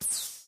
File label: robot shoot small; steam camp